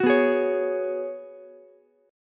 G sharp Minor 11th, Ukulele C6; strummed; 11,11,16,16

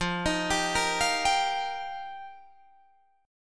F 9th Suspended 4th F Guitar Drop D, chord

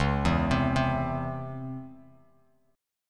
C sharp Minor Major 7th Bass Standard; C#mM7 arpeggiated; 9,7,10,5; JGuitar

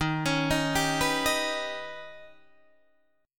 Eb Augmented 7th, Guitar, Open D

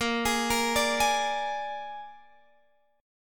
A sharp 7th A sharp, Guitar, Open G

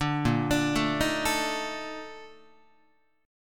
A sharp Major 11th Guitar, chord; A#M11; arpeggiated; JGuitar

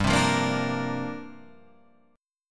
C 7th Suspended 2nd Suspended 4th, G

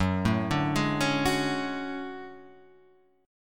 D Major Sharp 11th F sharp, chord

